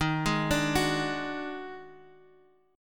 D sharp Minor Major 7th Double Flat 5th D sharp Guitar Half Step Down